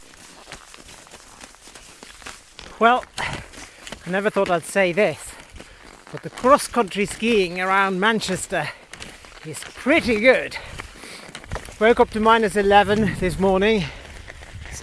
xcountry skiing; in manchester

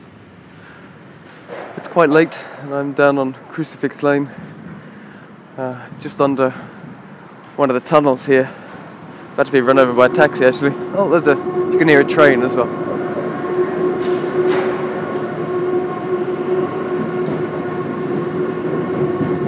tunnel skating

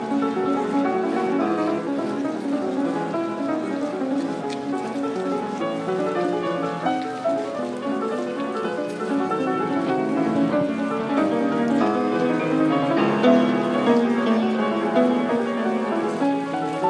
grand piano on the street

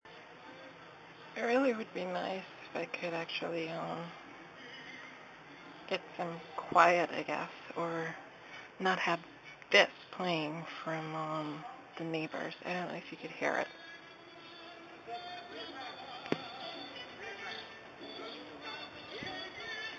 annoying music from neighbor

